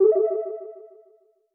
ACCENT 130 BPM A Min; Ear Candy; Snes; Cymatics; ANALOG EVOLUTION Beta

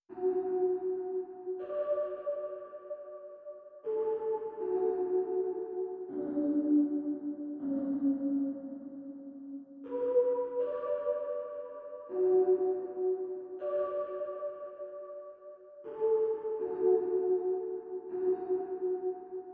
80 BPM D Maj Pluck; RnB; Loop Stems; Cymatics; To You; 2022 Melody Collection; RnB Pt 2

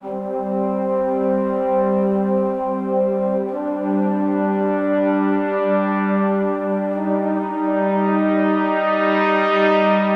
Brass - Trombone - Cm 70Bpm; Ghosthack; SkyRealm

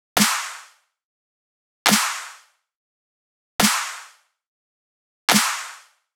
Riddim Drum Loop 140 Snare Clap; Ghosthack; Riddim Essentials Volume 2; Full Drum Loops

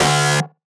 synth screech oneshot D dry; Synth Hits; GhostHack

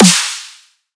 Snare Dubstep; Trap and Dubstep

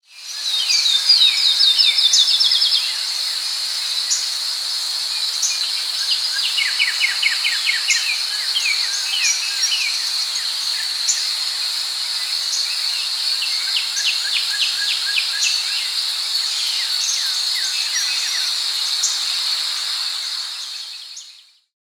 Nature; Ambience

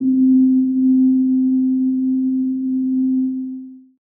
PAD Lonely - C, Synth One Shots

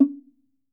Log Drum - C#; percussion; Cymatics - Infinity Beta Pack 2.0; Zeus Percussion Collection

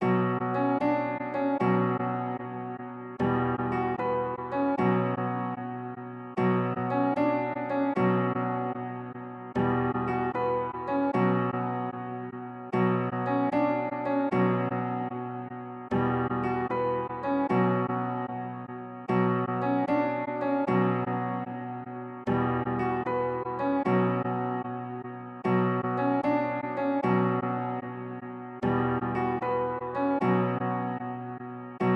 151 BPM B Min Piano, Trap; Cymatics; 2022 Melody Collection; G Wagon

